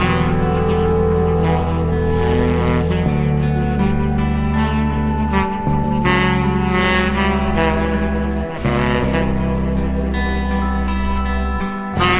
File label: sax and guitar, sad; loops